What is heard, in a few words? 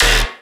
Bass Hit D#, Dubstep; Twilight Bass; Ghosthack; AC2024 - Day 13 - Dubstep Sounds 2025